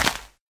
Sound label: grass, step; minecraft pe 0.6.1